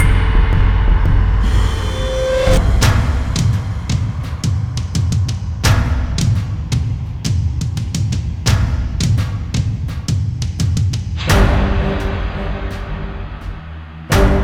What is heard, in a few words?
Battle LOOP